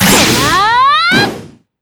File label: throw; mai natsume